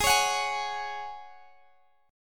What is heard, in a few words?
Ab 7th Suspended 4th Ab down Guitar Drop D